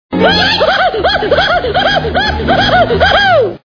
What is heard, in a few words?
cartoon